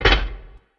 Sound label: Bridge Close; Myst III Exile